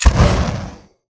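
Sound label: Tick Movement Traverse Jets; Titanfall 2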